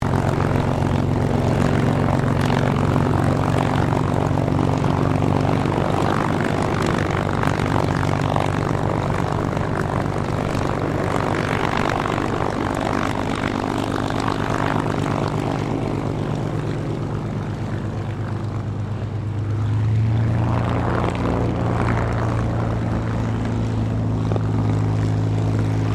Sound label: AIRCRAFT CAC CA-18 MUSTANG MK23 IDLE TAXI STEREO, WW2 Mustang fighter plane; Airplanes; SoundEffects-Foundation1